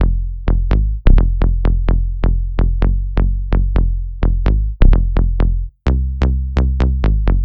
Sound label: Big Room Prog House